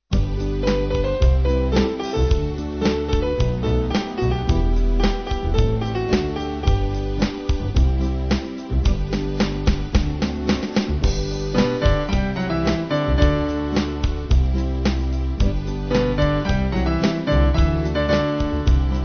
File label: Band